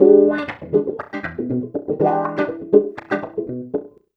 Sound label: 120 WAH WAH, Electric Guitar Loops; USB Soundscan vol.04 - Electric & Acoustic Guitar Loops; AKAI